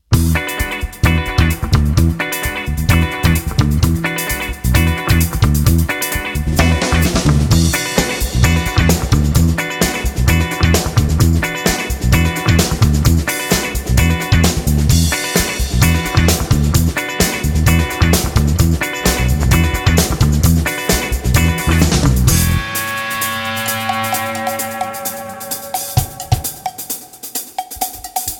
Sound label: Funk Beat